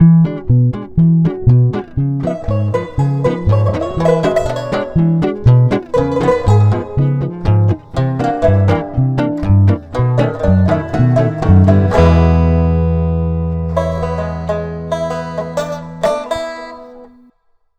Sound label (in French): musique phonographe